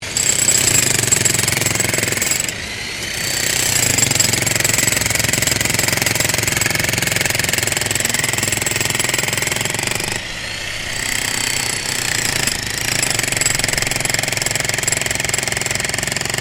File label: JACKHAMMER BOSCH ELECTRIC DIGGING BITUMEN STEREO, Construction Bosch jackhammer